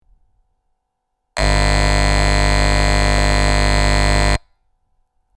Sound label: Buzzer